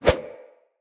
Flip Whoosh; Characters

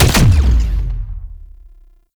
Alt Fire Punch; weapons; Scaldra AK

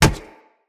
Kubrow Run Metal, Footsteps

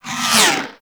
Ghoul Expired Grenade Launch Whoosh; Warframe; Grineer Ghouls

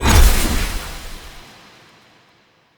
Mote Ability Generic Cast Impact; Motes; Default Cast

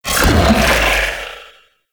Zanuka Companion Clone Burst; Warframe; Characters; Abilities

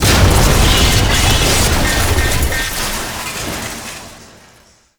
Academy Door Destroy; Tauron; Warframe; Betrayer

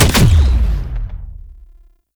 Alt Fire Punch; weapons; Scaldra AK

